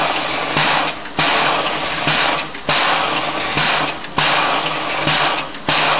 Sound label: compressor; Aisne